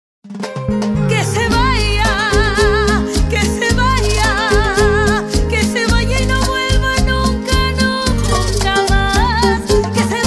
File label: Cumbia